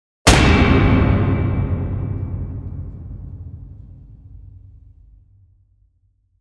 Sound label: Hit Metal; Ninja Tracks